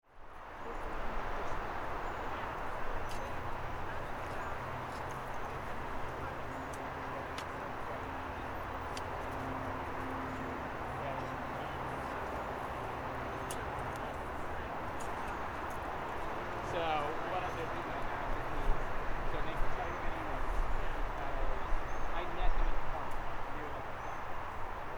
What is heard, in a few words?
allemany farm